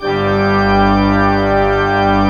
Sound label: MANUAL G1, Pipe Organ; E-MU Producer Series Vol. 2 – More Studio Essentials; Keyboards